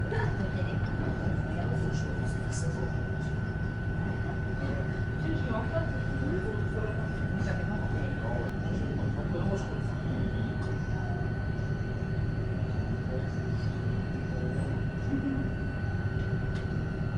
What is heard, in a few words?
ambiance, Metro